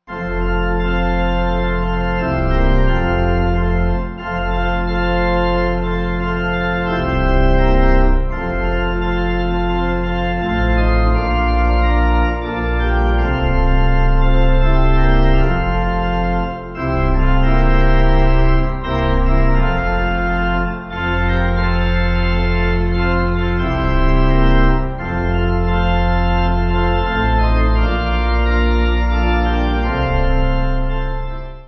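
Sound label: Thou My Everlasting, Pipe Organ; Vail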